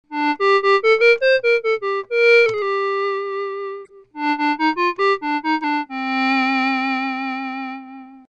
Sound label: clarinet